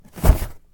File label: putting a heavy box